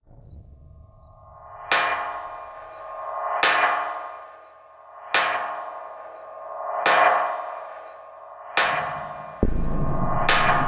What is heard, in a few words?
metal beat